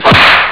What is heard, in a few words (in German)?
schlag normal; Amiga Soundtracker sample